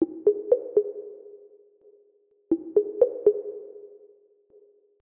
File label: dialing; bp better messages websocket